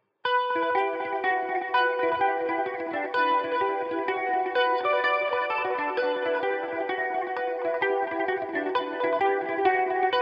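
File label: E minor revelation, Irish mandolin; butterfly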